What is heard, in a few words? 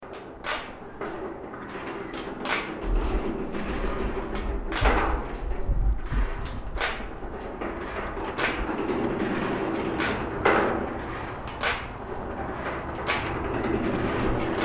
windpump